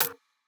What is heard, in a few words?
Hihat, Dubstep